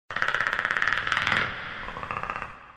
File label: predator clickin ringtone